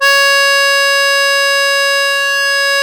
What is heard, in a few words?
MUSETTE C#3; USB Soundscan vol.23; AKAI